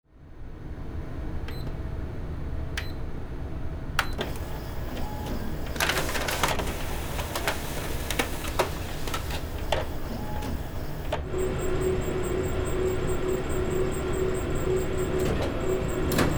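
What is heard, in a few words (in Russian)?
фото-копир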